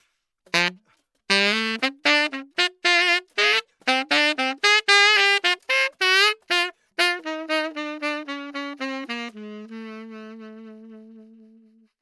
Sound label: saxophone; lewitt